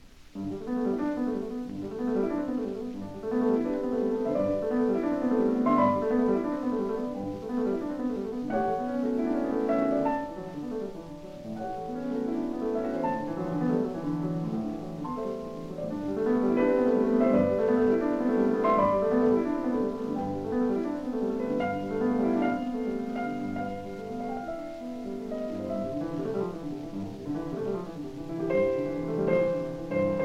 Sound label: Prelude in G Major